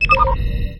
ambient bridge, computer

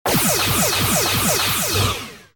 klingon weapon

